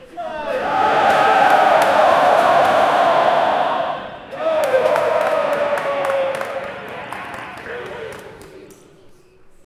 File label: huge audience in a galler, arena